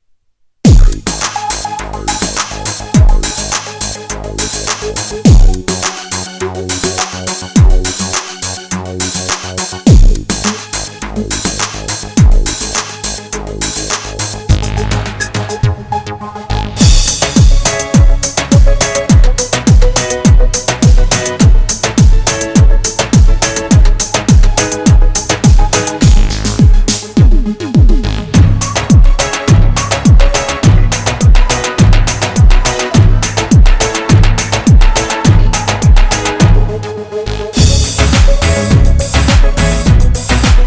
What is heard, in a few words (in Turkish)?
pop halay